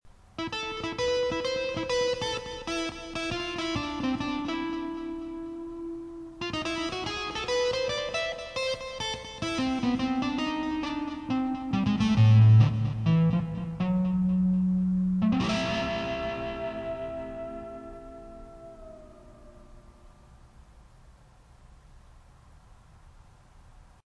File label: arturia origin booing